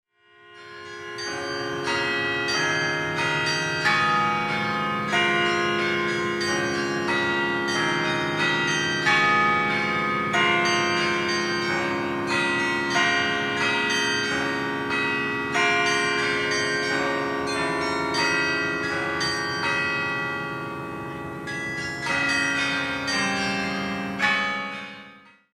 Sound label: Shepherd Playing on his Pipe, carillon; Range: 3 Octaves; Publisher: SMP